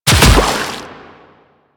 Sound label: Land Water, Footsteps; Warframe; The New War; Kahl; Quests